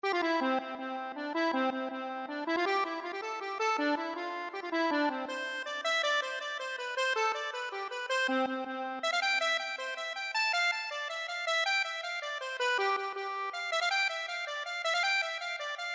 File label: accordion